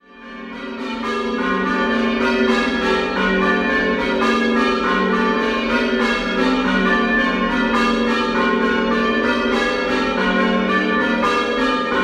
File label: Calstock call changes